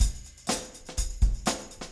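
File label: beat, Room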